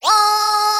Loud Wail Loop; HeavenStudioPlus; gleeClub

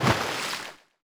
jump Others; HeavenStudioPlus; splashdown

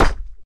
book; Heaven Studio Plus; cheer Readers; book Player

